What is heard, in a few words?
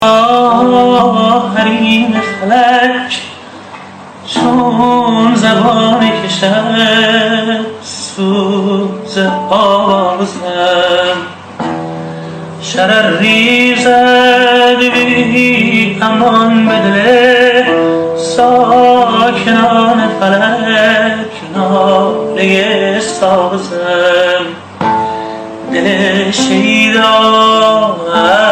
Guitar